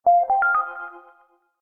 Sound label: Electric, notifications; Coolpad; C558